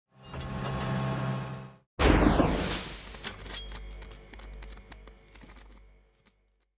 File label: Logo; unsighted